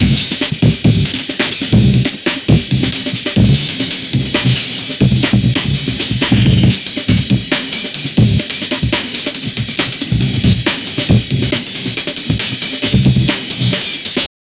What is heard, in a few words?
amen break sample, drum